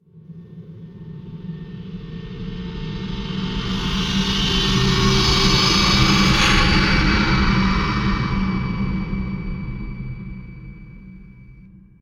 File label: Loot Machine Active